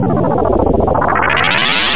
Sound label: space tal